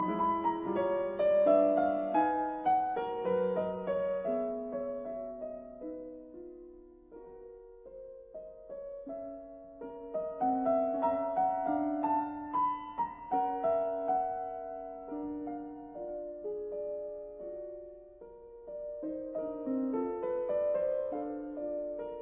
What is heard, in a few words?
Mazurkas in E flat minor Mesto; 9 Mazurkas Op 25 No 9